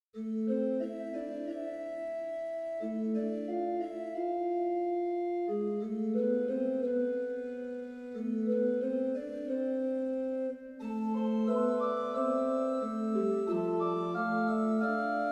Prelude en La mineur, organ; Mostoles

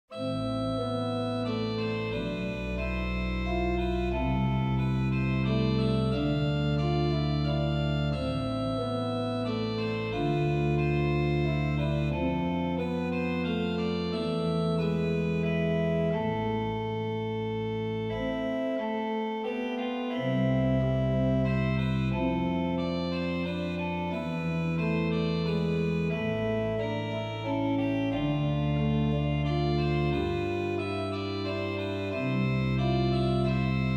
piece facile, organ; Mostoles

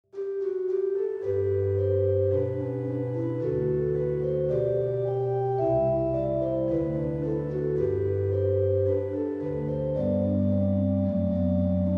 noel en sol, organ

